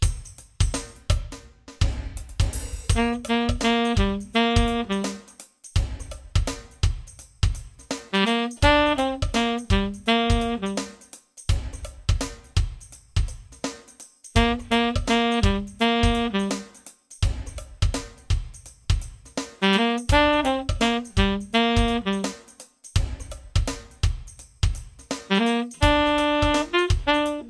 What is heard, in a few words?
Tenor Sax